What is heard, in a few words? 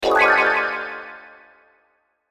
Hit Burst Twinkle Pan; Production Element Imaging Element Accent Transition